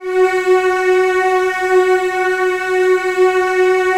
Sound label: F#3, Strings; Virtuoso Strings; ILIO; Kirk Hunter Virtuoso Strings VOL-2; 90 s Sample CDs